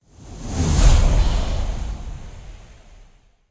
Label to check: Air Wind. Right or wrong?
Right